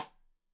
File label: Side Stick Stereo; Deeply Sampled Drums